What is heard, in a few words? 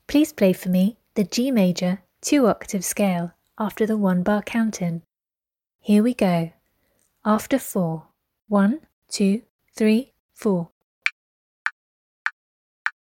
g major 2 oct